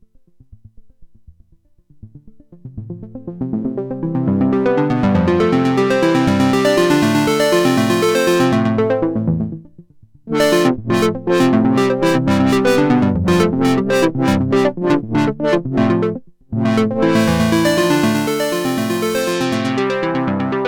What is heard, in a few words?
midi tweak